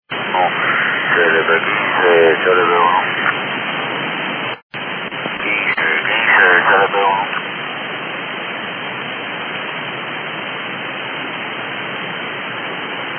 cuban

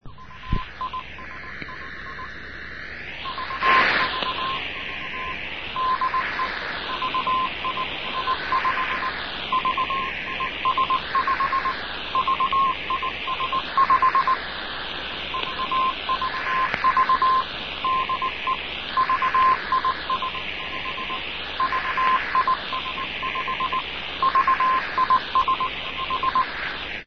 cw vvv

